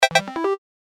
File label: line win; Step Back 7's